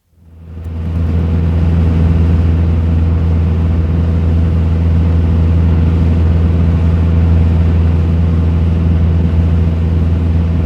Beagle Airdale, interior recordings, Constant flight; BBC-Historical